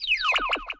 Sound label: Magical